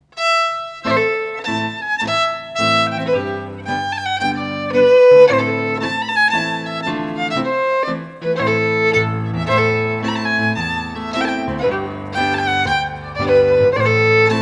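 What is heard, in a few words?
Reel, Scottish Fiddling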